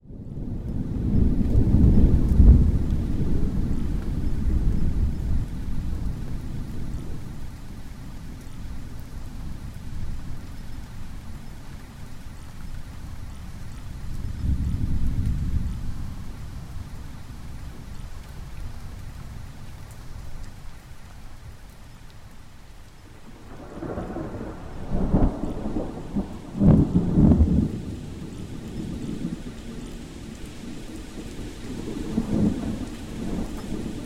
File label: thunderstorm sounds